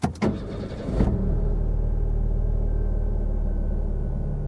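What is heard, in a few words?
muffled sound of a working engine in good order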